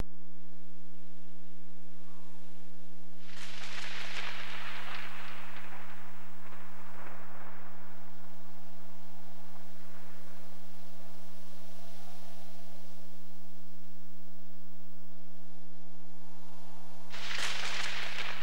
Thunderstorm, Wind, Ambience

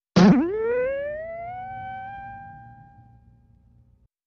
Bing, metallic, insecty, long, going up; bings

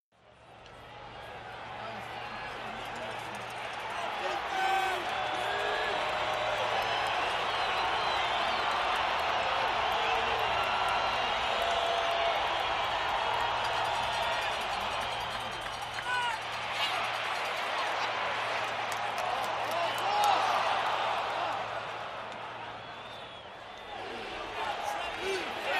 Football Crowd Exci, sports arena football

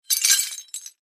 Wood Tile Lrg Squares, debris

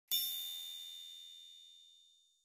Spring Thin Sci Fi, buttons & beeps

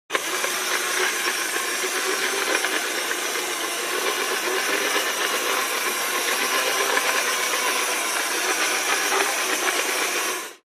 toy motor; toys

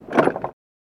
Cessna Pedal Down

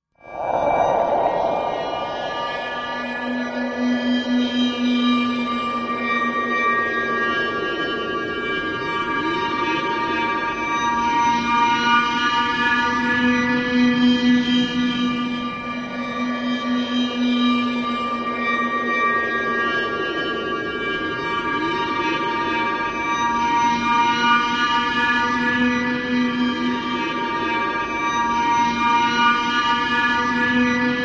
Call Torment Static, scanners

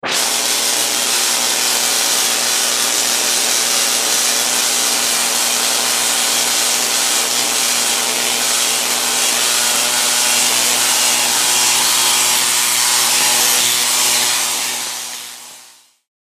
Electrical Shock Zap

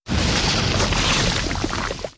Dinosaur footsteps in mud; animals wild dinosaurs

